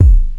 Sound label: KICK, Hip Hop